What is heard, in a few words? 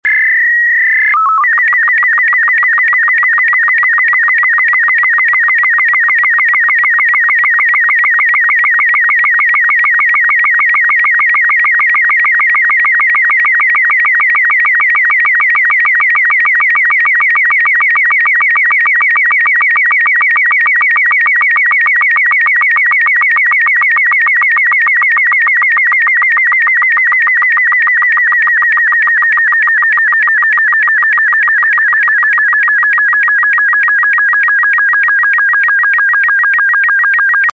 sstv robot 36